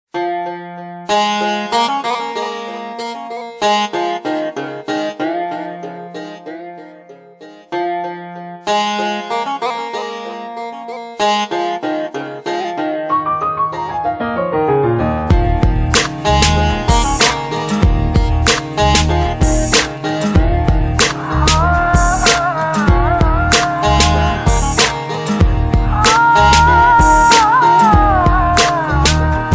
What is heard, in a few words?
Nice Music Ringtone; ringtones